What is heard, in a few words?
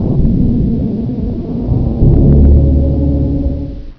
distant tele